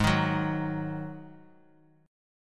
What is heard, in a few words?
D sharp Minor Double Flat 5th G sharp